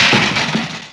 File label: clatter; N64; MARIOKART64